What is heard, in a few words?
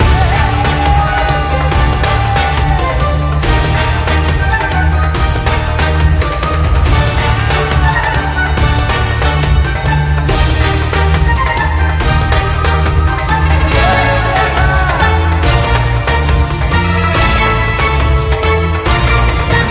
super Mega Win Loop; wild bazaar